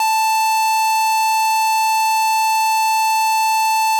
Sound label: square, 808, SubOsc